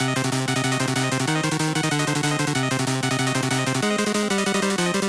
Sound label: Boss Fight Intro Mono; Sigi A Fart for Melusina